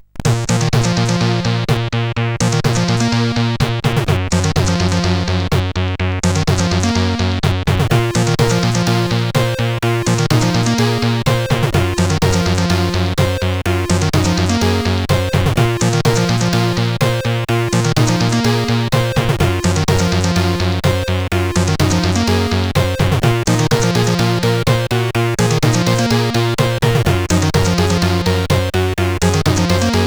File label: Intro Tune, sid CSG8580R5